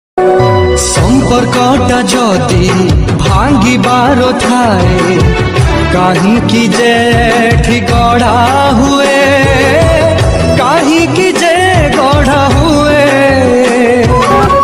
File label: odia old sad song